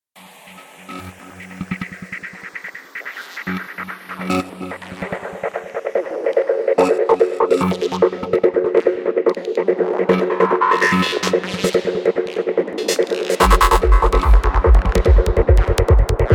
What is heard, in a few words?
sonic boom psychedelic trance